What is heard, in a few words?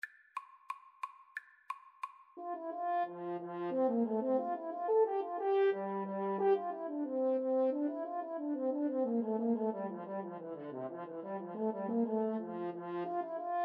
sailors hornpipe 2 horn